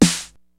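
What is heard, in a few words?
909 House; Rubber Chicken Software Sample Bank; DRUMS + PERC; OTHER DRUMS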